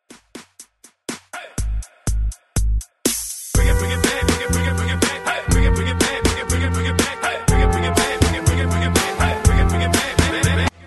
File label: Hyped Intro Outro 60s 122 bpm; Redrum